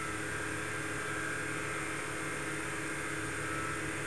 console; kopovcasino

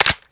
reload empty chamber, AR-15; Assault Fire

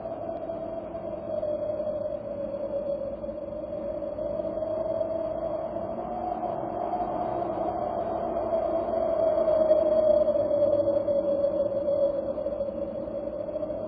wind; cstrike

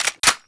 SR25 reload; csof

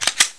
9mm clip, items

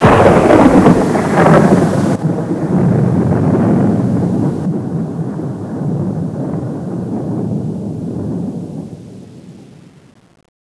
Thunder

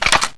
m249 cover up; czero opforce

